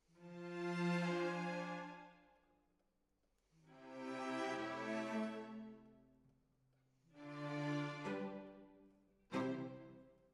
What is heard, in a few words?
Decca, Jazz for piano